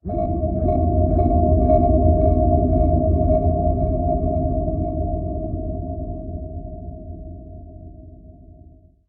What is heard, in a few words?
Ambient